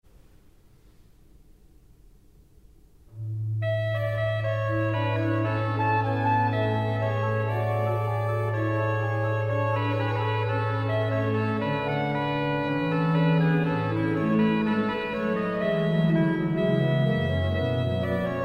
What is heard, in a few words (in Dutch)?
Prestant B Holfluit D en Hobo met Bourdon